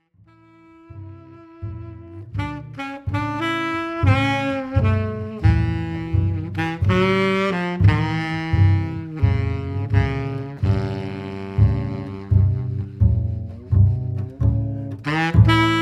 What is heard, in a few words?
jazz sax bass